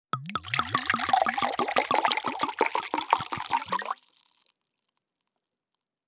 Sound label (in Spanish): gorgoteo botella vino sonido agradable